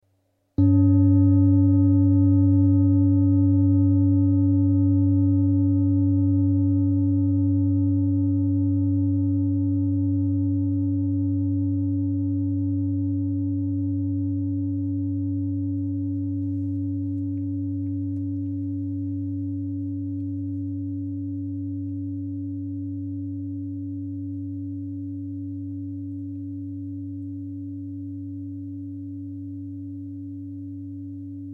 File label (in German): Bauch Schale